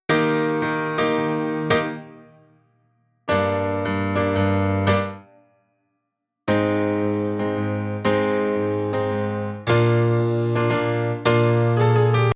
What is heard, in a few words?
piano